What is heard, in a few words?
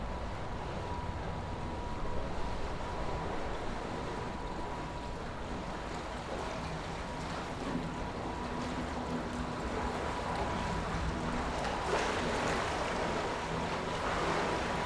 Electric Powered Boat